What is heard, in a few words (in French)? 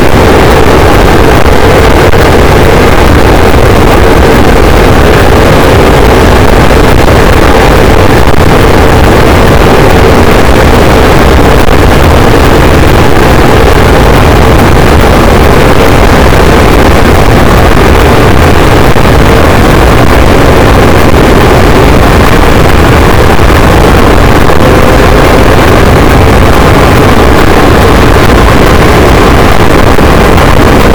cachalot positif, boat; Physeter Macrocephalus